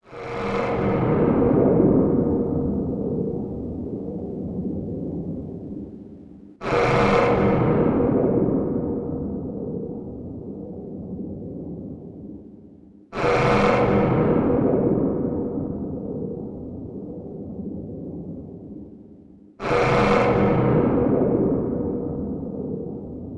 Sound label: alert loop, ambient alarms; citadel; Half Life Model Pack; Half Life 2